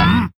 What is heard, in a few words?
jump